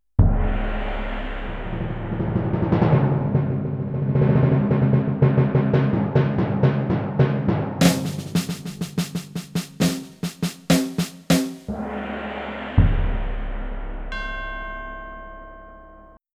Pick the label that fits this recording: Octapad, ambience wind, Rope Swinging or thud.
Octapad